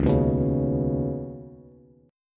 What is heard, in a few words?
Db 7th Suspended 2nd, Bass, Db7sus2 chord; JGuitar